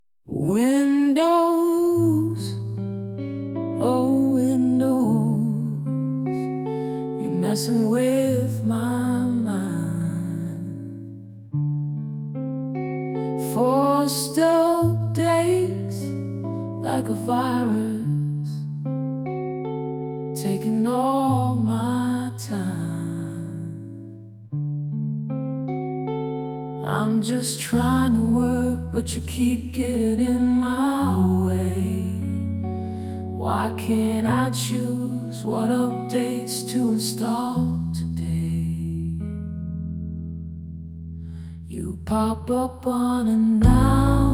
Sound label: Blues